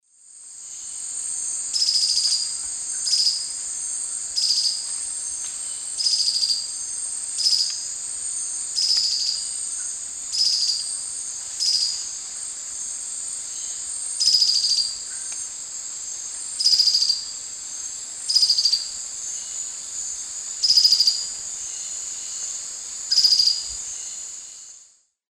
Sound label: Hylophylax poecilinotus, alarm call; S. America; Eastern Lowlands